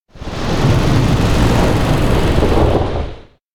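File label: electronic enter cumulonimbus; anemoi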